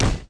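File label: Impact Small; urbanchaos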